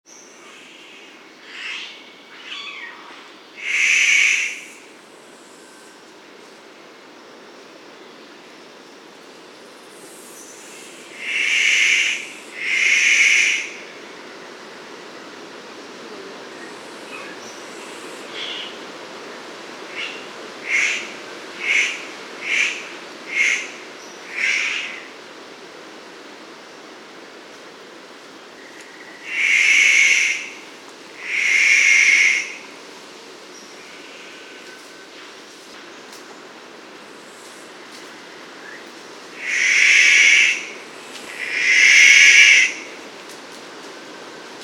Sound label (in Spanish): canto ave del paraiso fusil